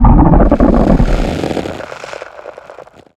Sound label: organic texture giant animal; Bluezone Corporation